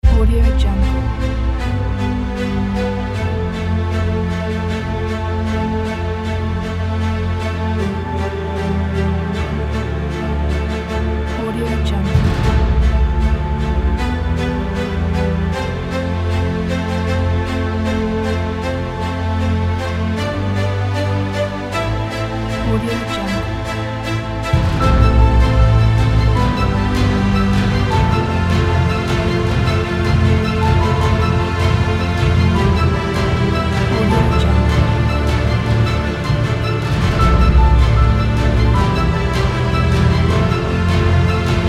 inspiring emotional trailer

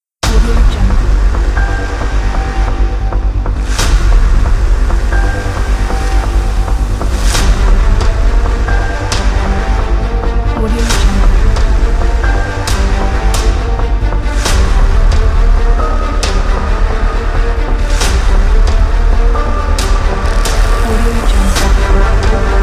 epic aggressive trailer intro

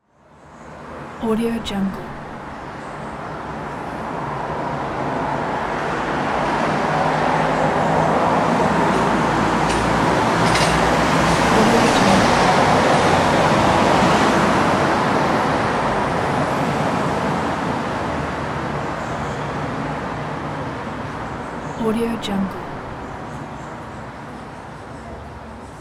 city traffic

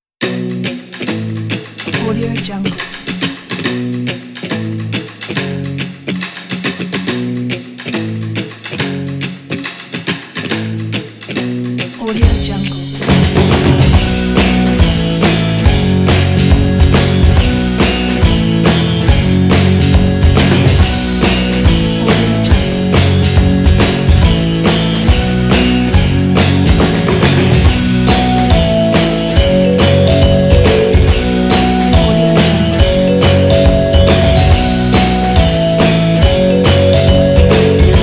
vintage rock

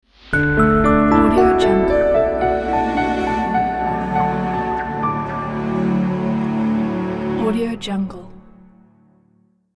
elegant piano logo

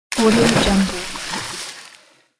water splash

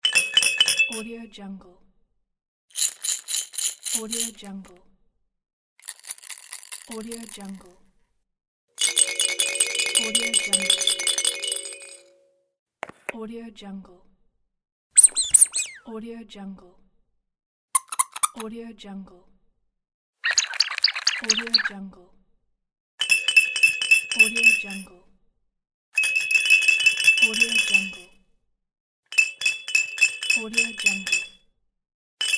childrens toys big pack

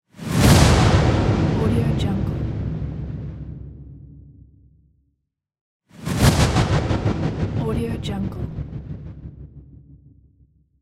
cinematic logo reveal whoosh

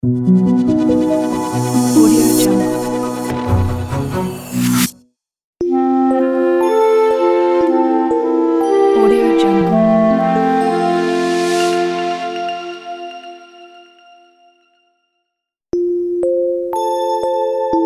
elegant soft logo pack

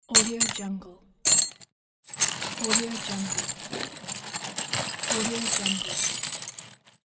metal objects

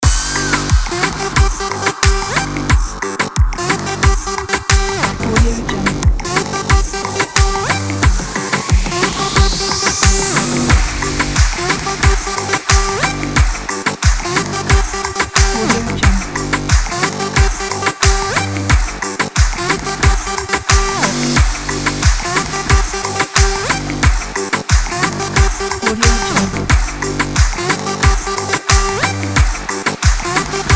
stylish fashion dance loop

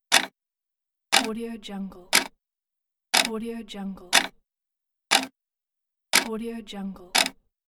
ticking clock

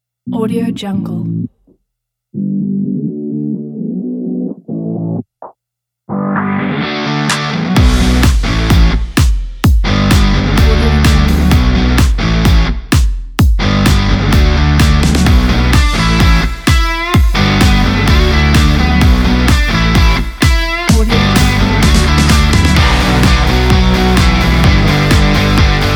cool indie pop rock

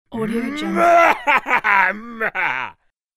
bad male success scream

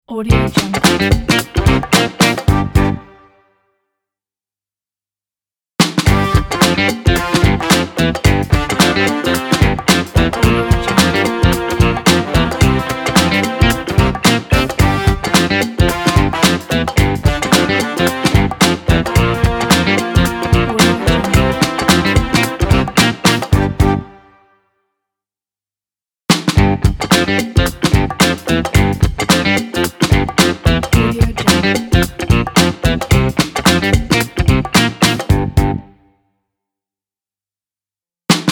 funk intro logo groove

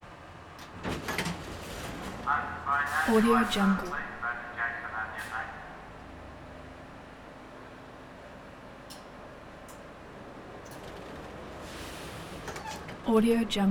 new york city subway door

